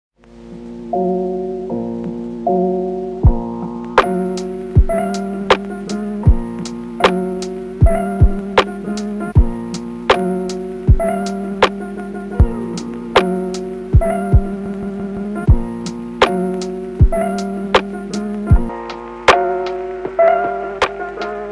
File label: lofi echo; lofi mafia